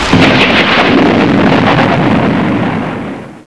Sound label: thunder